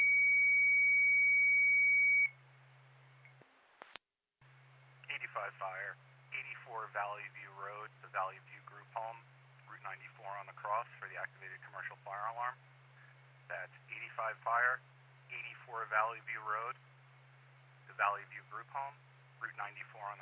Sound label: Siren; Lafayette FD